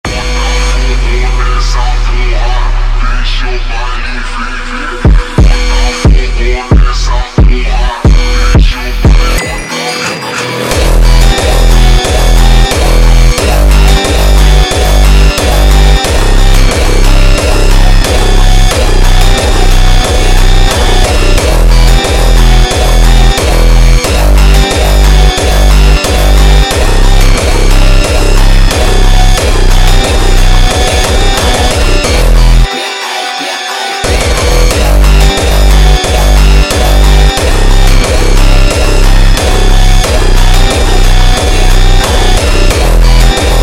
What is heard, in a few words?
Funk ultra Slowed